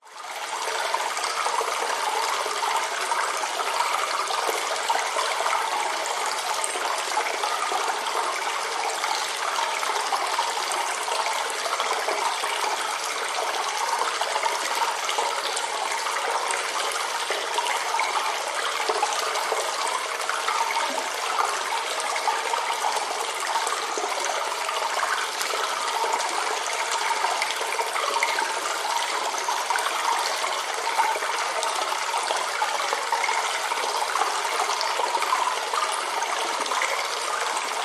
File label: nature cave ambience water stream trickle reverb echo; zapsplat